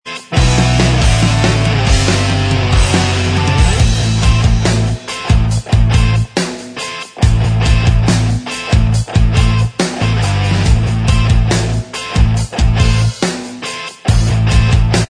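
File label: punked up, Instrumental Track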